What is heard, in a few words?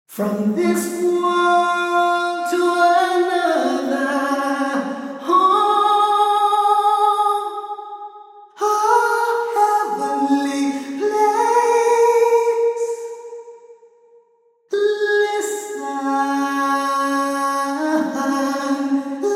orch, dram hall